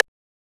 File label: RIM; X-Static Goldmine 1